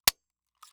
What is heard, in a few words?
Industry Tool Staple Gun Staple